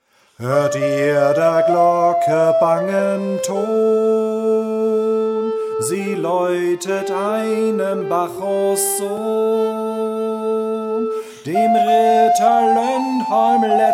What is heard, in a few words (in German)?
Glocken; Fredmans Gesang No. 6; Carl Michael Bellmann, 1790